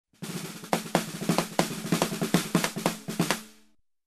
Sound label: Snare Rolls and Hits